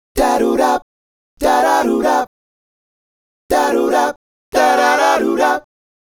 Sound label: Male Group, Vocal